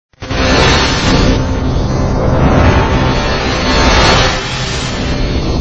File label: Heavenly solar music